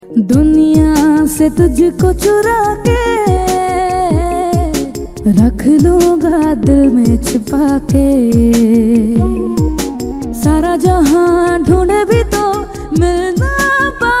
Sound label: Song Ringtones